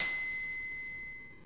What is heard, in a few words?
food ready; SpongeBob Diner Dash ios gamerip